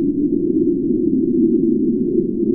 vent wind

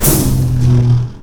jump pad; Dystopia; cyber

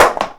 wood break; Dystopia; physics

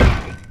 heavy concrete, footsteps; player; heavyfeet; Dystopia

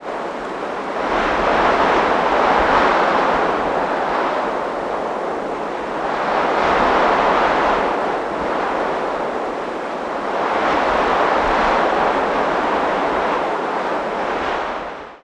wind edge; Dystopia; vaccine

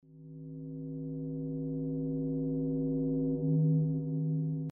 ambient scape; Dystopia; meatspace

